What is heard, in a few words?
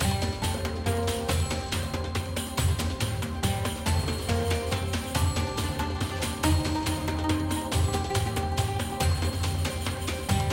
music; Fantasma; medallion